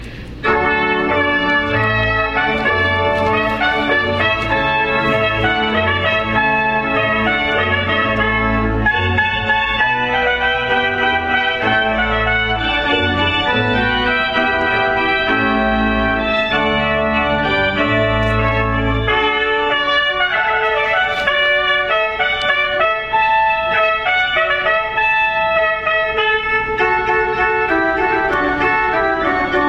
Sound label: Hornpipe